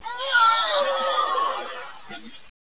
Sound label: raptor die simple